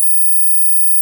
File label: Tubular Bell C7